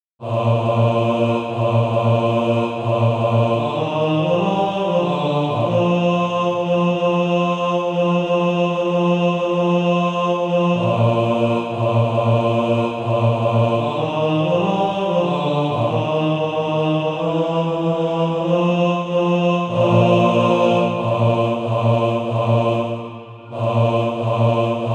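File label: bass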